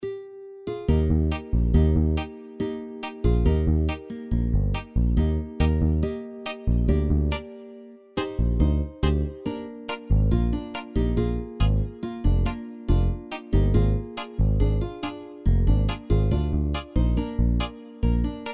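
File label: Bass Rhythm Guitar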